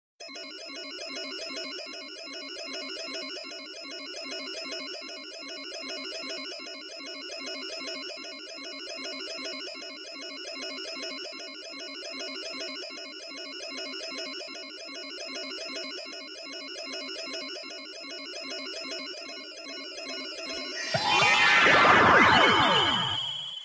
magic sound effect

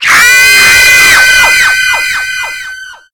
sirene scream; games; ringtones

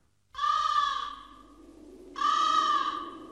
Double Anime Crow

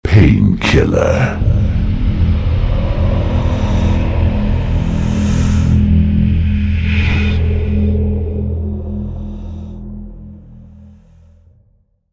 hard rock, ringtone

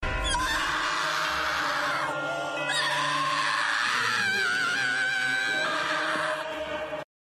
Scream

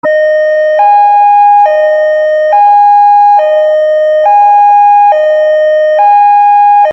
3D Police Siren

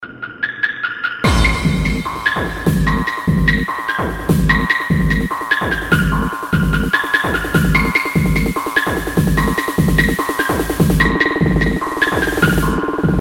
sms sound hardstyle

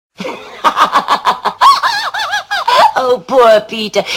freak laugh; ringtones; carry on

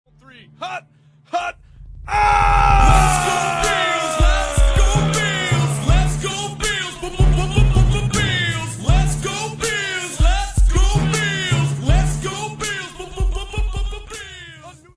rap

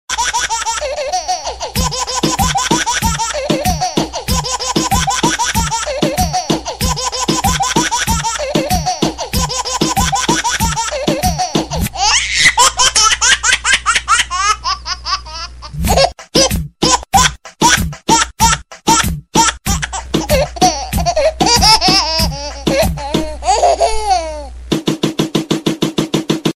baby rap

